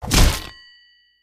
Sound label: Head Hit Axe, gore stabs